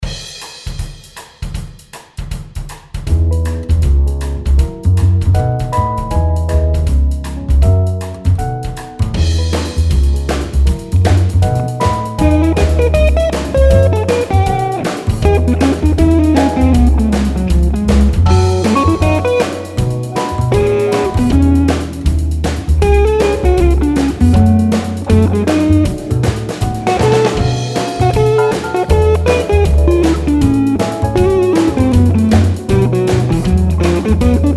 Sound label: improvising mix 158bpm